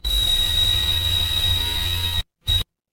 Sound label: door doorbell